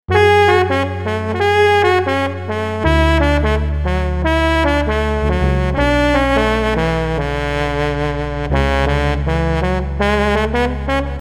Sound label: saxophone